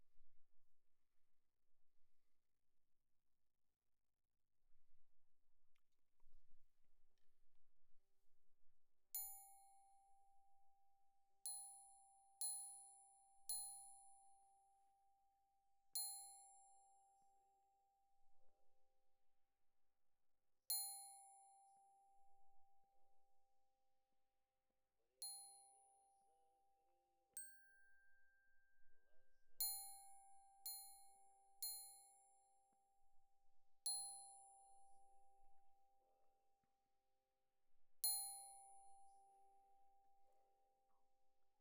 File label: Glock Close